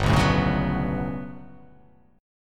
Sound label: G Major Sharp 11th G down, chord; strummed; JGuitar